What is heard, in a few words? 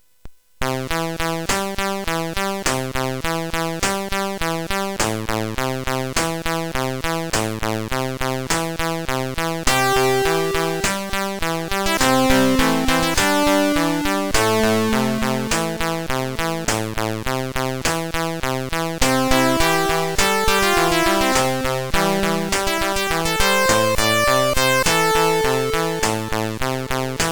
Super Synth Sample II CSG8580R5